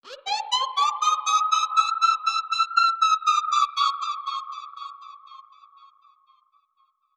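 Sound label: Modulation Proc, alarm siren samples, 120bpm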